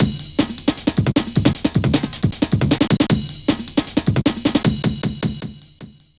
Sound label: drum loops, jungle; Jungle Warfare 1&2